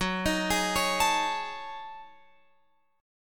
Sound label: Gb Minor 6th Add 9th Gb Guitar Open F; Gbm6add9; arpeggiated; x,13,15,15,16,16